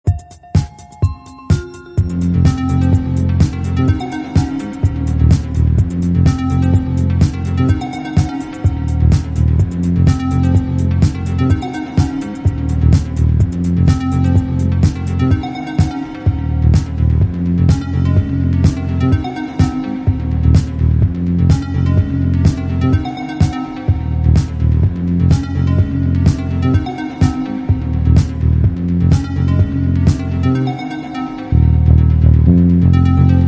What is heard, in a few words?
Arp